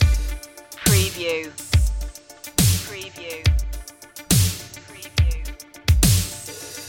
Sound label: trip step dub step loop